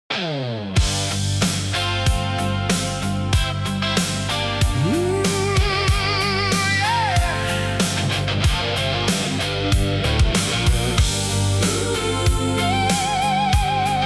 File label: ballad